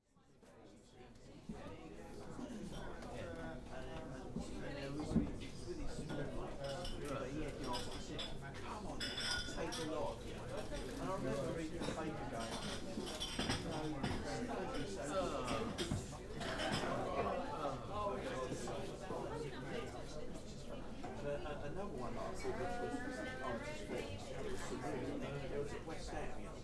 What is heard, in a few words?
Ambience Pub Base